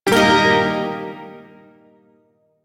Shawzin Blues Chord; Warframe; Duviri Shawzin

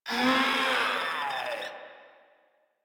Infested Runner Attack; Warframe; Runners

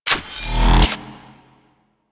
Shape Blast Shotgun Reload; Warframe; Weapons; Corpus

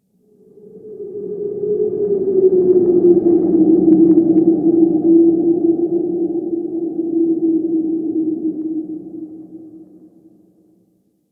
Interior Wind Howl; Ambience; Duviri; Warframe

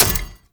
Sticky Bomb Proj Land; Grineer; Veilbreaker

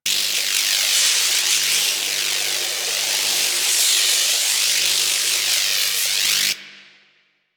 Absorb Ability Bolt Loop; Enemies; Warframe; Infested; ZealoidPrelate

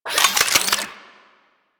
Modular Secondary Handle Fast Reload, Guns; SU Modular Guns; Reloads

